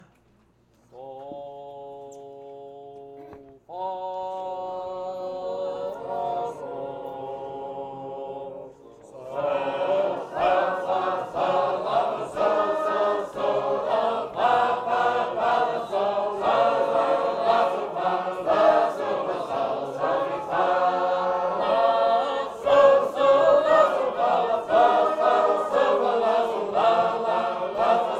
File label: Few Happy Matches